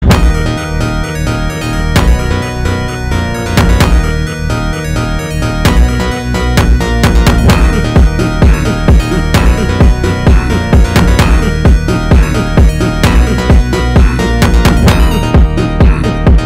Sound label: brazilian phonk